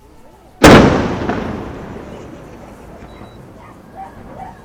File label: bang